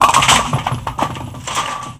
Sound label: BOWLIN 120 BP, rhythm loops; Rarefaction; Poke in The Ear With A Sharp Stick